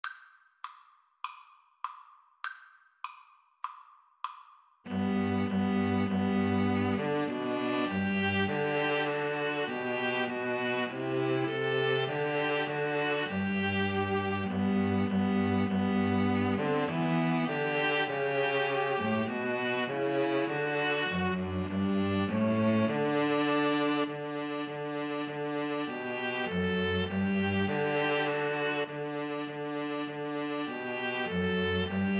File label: hark, String trio